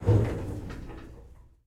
Pilon Servo Start; Puddle; puddle plus ios gamerip 2018

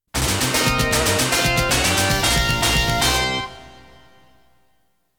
Lost Game (Game Over); Konami Game Music Collection Volume 0

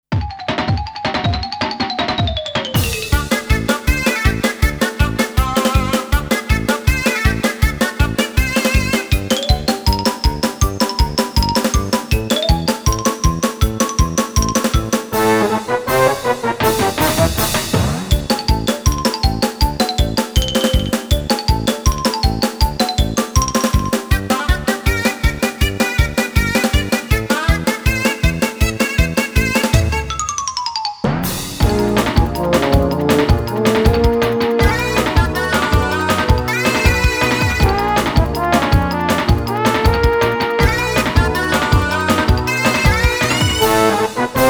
Noisy Uproar

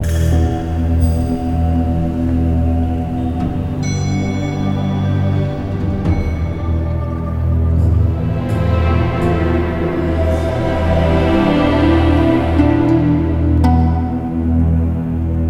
Caves Melody Loop; lego universe; Ninjago Monastery

